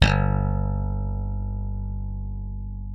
G1, slap thumb; Xsample VOL-1

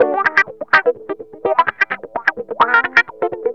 GUITARS 135, House

